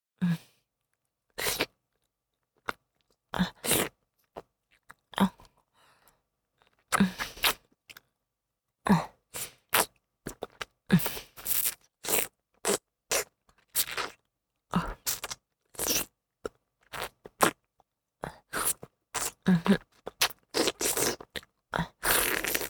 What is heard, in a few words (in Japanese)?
お掃除フェラ(弱):亀頭の先から滴る精液を舐め取る, チュパ音